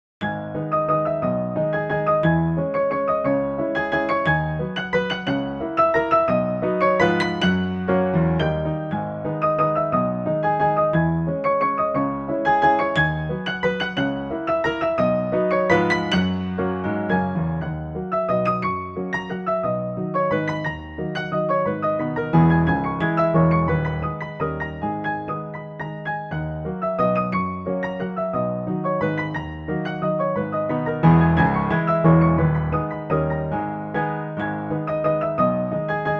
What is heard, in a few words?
boost Brahms Waltz; Light2Art boost Brahms Valse; instrumentale piano